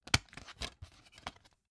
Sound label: dvd case remove dvd